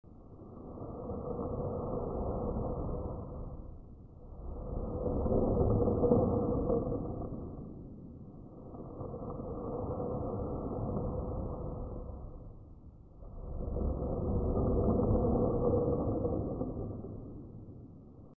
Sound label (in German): finger tisch resample